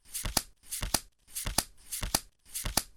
dealing cards

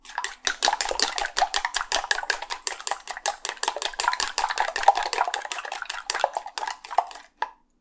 beating egg; cooking

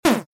shoot; arms

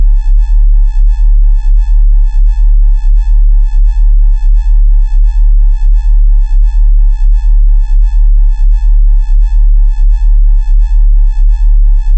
inside ufo

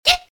surprising girl

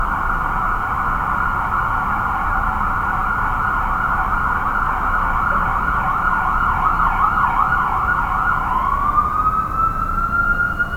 night siren

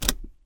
metal fitting on; car

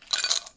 ice into glass; eating

